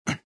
land, prisoner

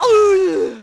fall; Splashdown; XBOX

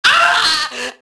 junkie get hit; enemy; Max Payne

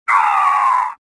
choke

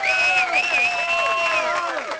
crowd; Ambient; MX vs ATV Unleashed; XBOX

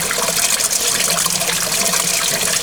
drain; Tony Hawk's Project 8; XBOX; shops

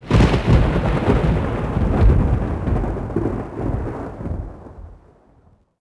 thunder; Ambient; MX vs ATV Unleashed; XBOX

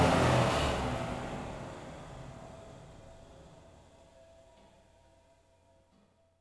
mine Fan end; machines mechanical

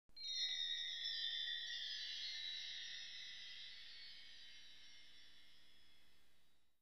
chime; Myst III Exile

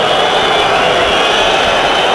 full time crowd whistles; David Beckham Soccer; XBOX

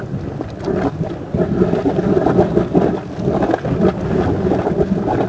lava spout; The Elder Scrolls III Morrowind GOTY; XBOX

